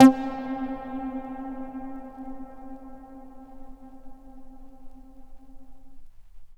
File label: DX200 Buzz Verb Vinyl Synths C3; vinyl leads